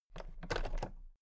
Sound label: toolbox latches open very soft